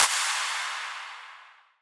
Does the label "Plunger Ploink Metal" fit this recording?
No